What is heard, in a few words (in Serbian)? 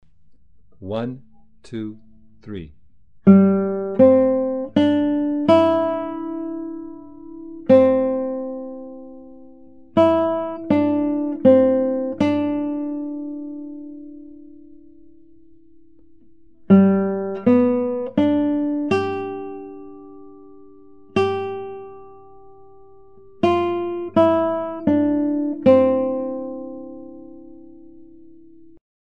Down in the Valley, Gitara